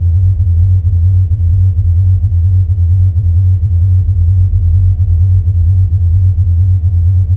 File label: cairn halls, ambience